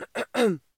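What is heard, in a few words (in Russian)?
сухое першение которое невозможно откашлять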